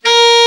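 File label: alto, sax; Best Service Gigapack 1; BR SAX 1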